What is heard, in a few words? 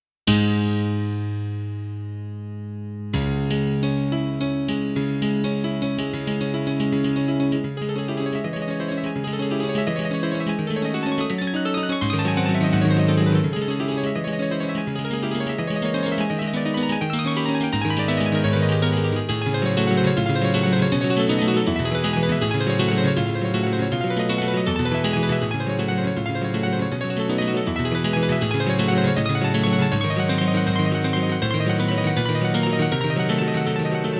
Piano; TR-RACK; M1